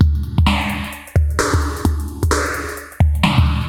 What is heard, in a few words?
Moog Phaser HR-16B (130BPM), cyborg beats